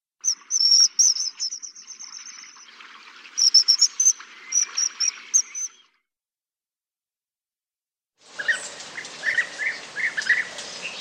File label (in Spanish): abubilla